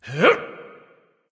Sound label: grunt jump; smite; ares